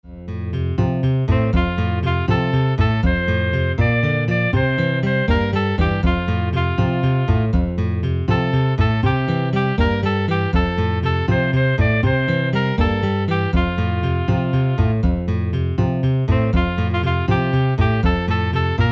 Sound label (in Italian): festa dellassunta chitarra; canti madonna